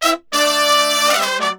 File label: 100, BRASS SAMBA